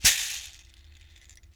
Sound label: Berimbau Shaker, World Percussion; Sony CS